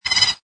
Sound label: Tui weep, Exotic bird - New Zealand bird Tui, tweeting, chirping.; Birds; Animals